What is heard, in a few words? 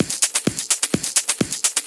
Clubhouse Loop 128 BPM